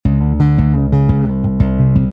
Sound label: Bass Chords D,Db,C 117, Electric